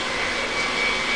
jet looped; Flying Nightmares; jetSnd

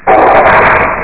Special Weapon; Amiga; Arcade; Wingnuts

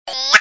springer Sound; 22,050 sample rate; 0.04 seconds; toxic jump

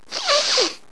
exaggerated sniff; sniff™ 0.9.0b2

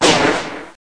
Laser snd; Soldiers 2

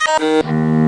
Viola

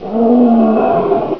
monster Sound; Ferazel's Wand Demo

